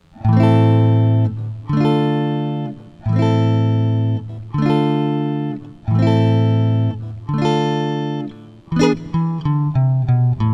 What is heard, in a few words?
Bar Chords; instructional materials; jazz tunes